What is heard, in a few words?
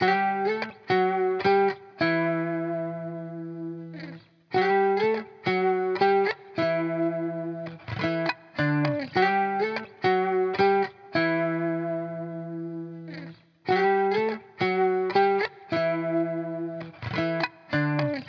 105 BPM E Min Lead Guitar; Guitar Pack; Loop Stems; Cymatics; Miami Guitar Pack - Pt 1; Frisbee